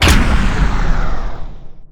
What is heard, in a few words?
overclock stun; weapons; dota; rattletrap